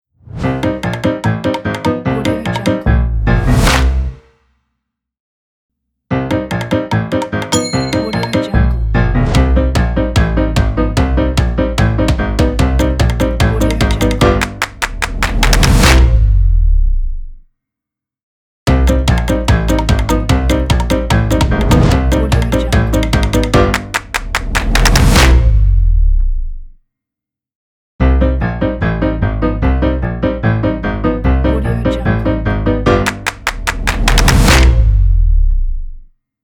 ragtime piano percussive and stomp logo

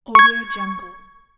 button press

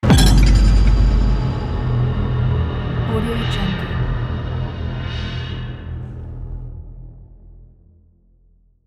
hybrid trailer cinematic tension hit

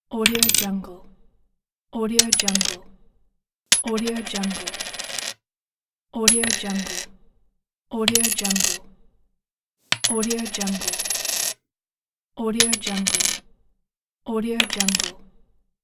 coin drop on wood

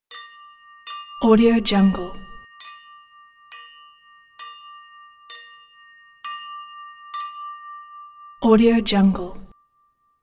silverware on glass

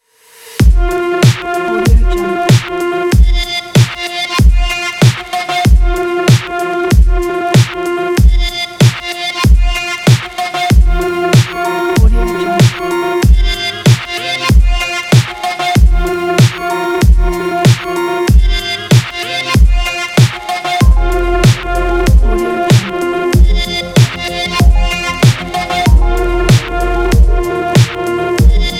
trance

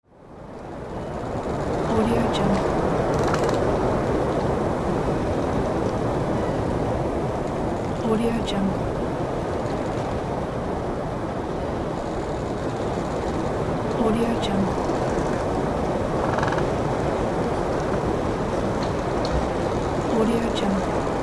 wind trees sound; soundall

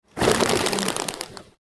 pigeons fly away

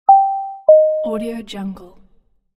airplane ding dong

